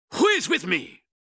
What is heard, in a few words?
tap; ioc chairman